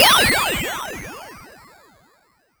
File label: Sine FX